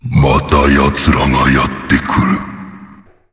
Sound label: zombie